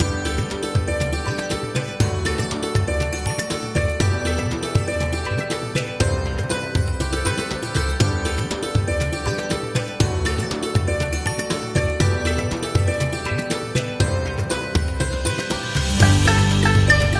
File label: Indian, global travel background music